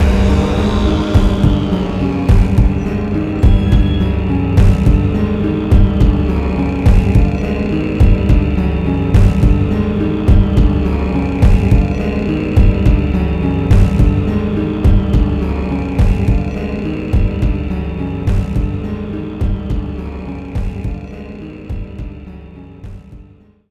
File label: bgm loop; monster hunter 4 ultimate; VirusFind